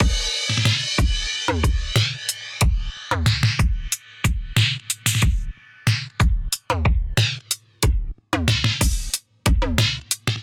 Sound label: Western Australia Kit 92 bpm, Drums; MIDI Clips; Drive and Glow; Ableton; Factory Packs